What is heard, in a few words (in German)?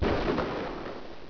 Knall